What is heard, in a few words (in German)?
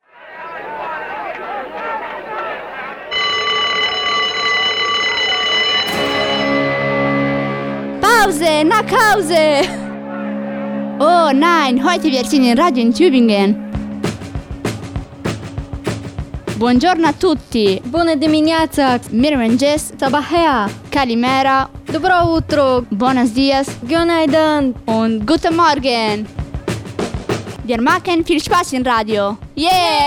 jingle pause nach hause